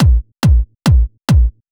Kick, 140 BPM; Vocal-Hook Kits

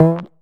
Synth Shots; Vengeance Samples

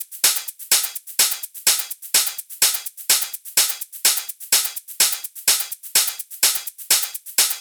126BPM Drums; Vengeance Samples; Malta Kit

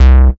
Bass C, Offbeat Bass

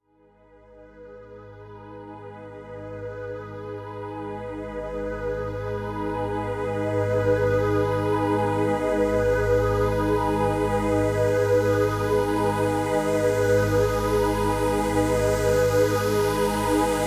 140BPM Mego Pad G#, Melody Fills; 8Bars; Vengeance Samples

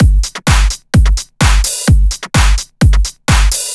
Housebeats 128BPM; Vengeance Samples

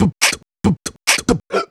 Beatbox Loops, Human Beatbox

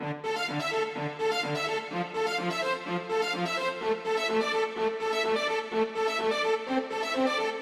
Root D 126 BPM; Vengeance Samples; VEH3 Special Sounds

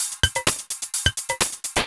Houseloops 128BPM; Vengeance Samples

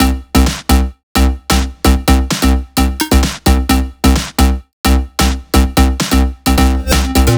130BPM Melody; VFH1 130BPM Danzon Kit

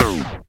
Minimal Perc; Minimal Percussion

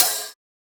hat; Dubstep Sample Pack

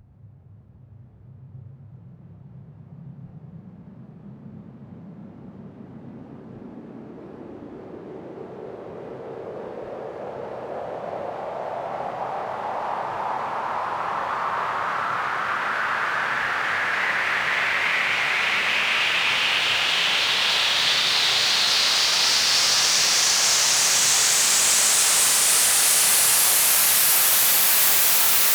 FX Uplifter

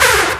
Synths H; Vengeance Samples

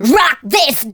Vocal Phrases; Vengeance Samples; Vocals

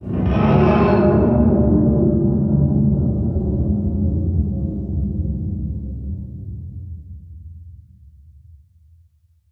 VFX Atmos

